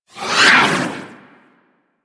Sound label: Sword Projectile By; Doom Sword; Warframe; Excalibur